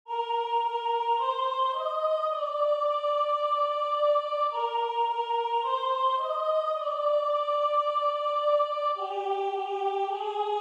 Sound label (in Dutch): koor Vrouwenstemmen